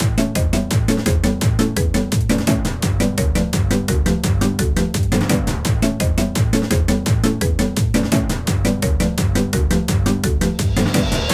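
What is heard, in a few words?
background music map train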